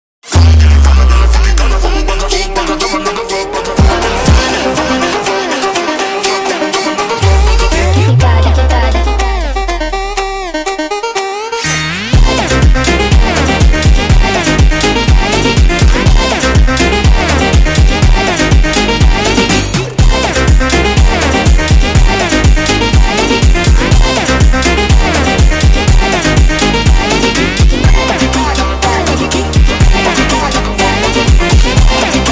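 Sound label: sped Up, phonk music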